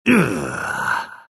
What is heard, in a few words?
grunts; dota; monkey king